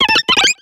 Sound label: happiny; cries